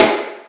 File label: Yamaha CS6, Drums